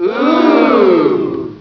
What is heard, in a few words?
OOH